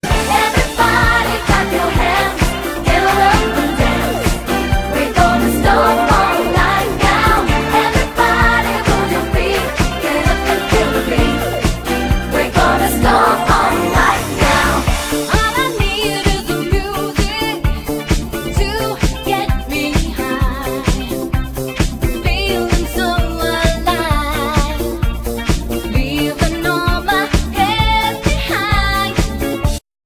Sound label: stomp